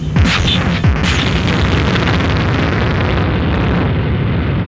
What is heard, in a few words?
CYBERGUN R, Hard & Loud Techno; USB Soundscan vol.01; AKAI